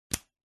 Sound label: technology computer apple macbook pro power lead magnetic connector plug in; zapsplat